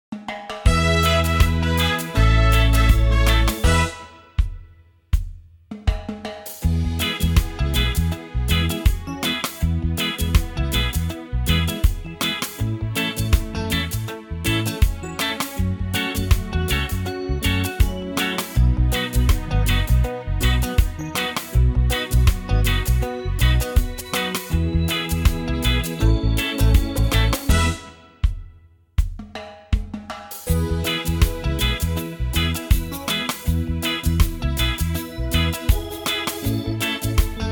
reggae

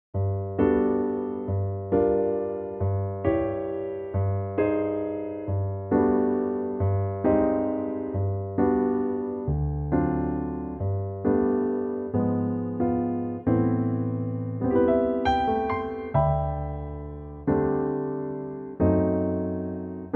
G piano

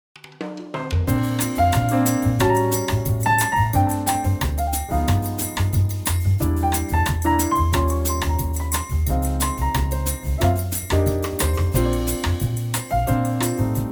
Bb Bossa

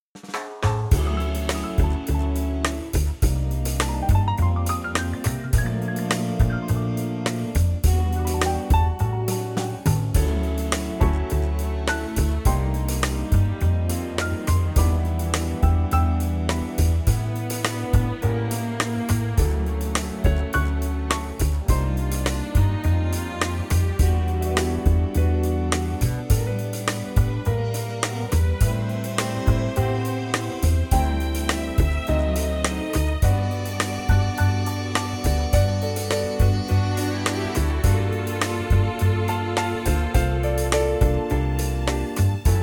Rhumba

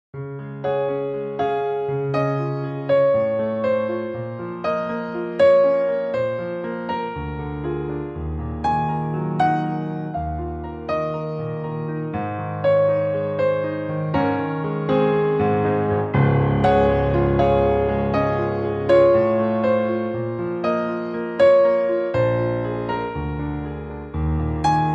Db piano

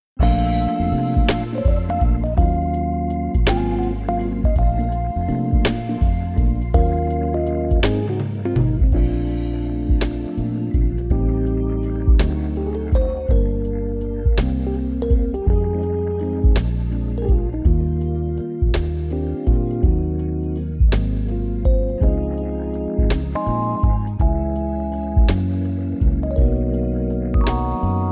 smooth

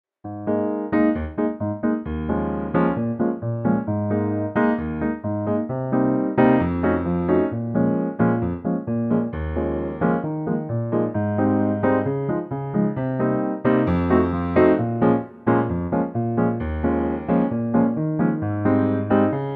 piano

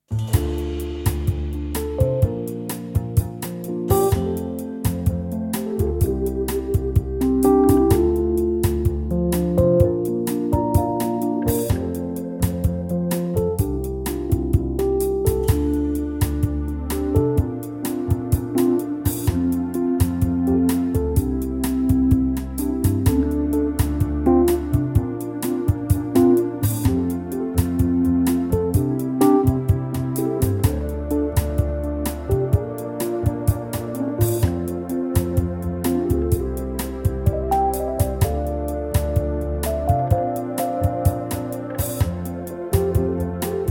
Dm Bossa

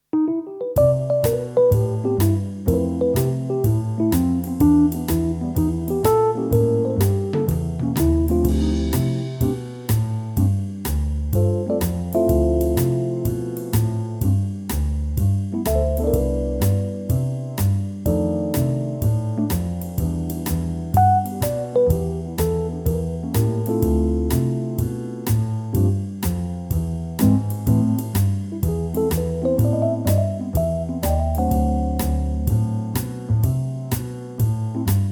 C No Sax

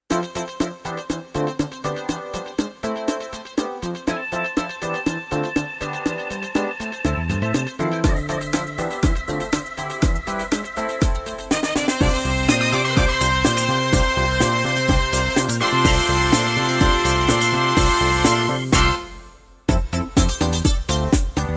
DISCO

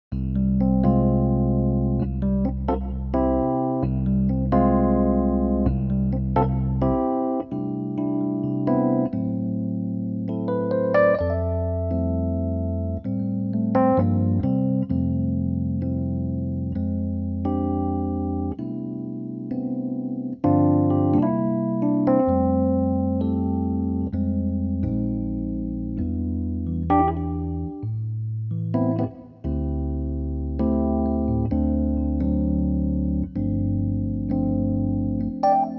C piano

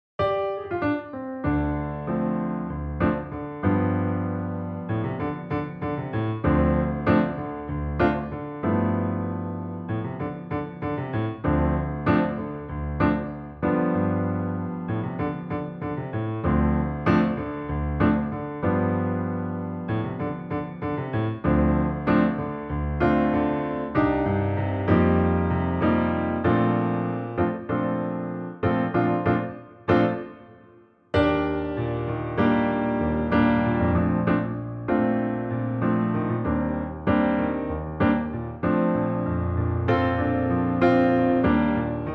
F piano

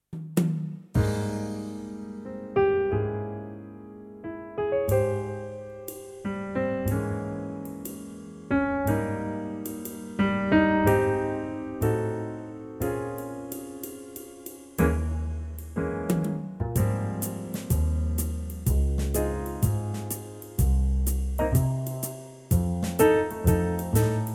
Ab swing

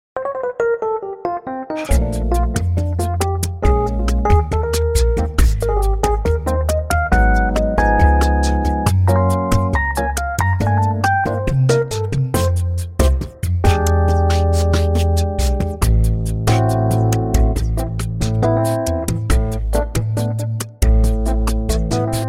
smooth

